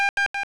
3 Beeps; Macworld (1997-09); Games World; Shareware Games; Noah!™ 1.0

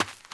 step, humans; sfx; starship trooper

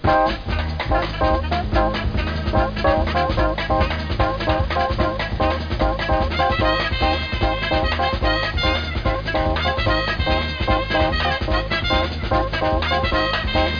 SAMBA; Gold Medal Software - Volume 5; music

